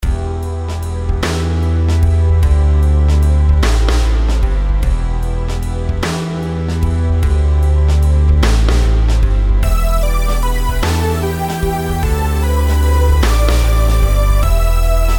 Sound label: rhythms compound 8s